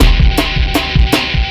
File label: Beat, Futurist Drum & Bass; Drums & Percussion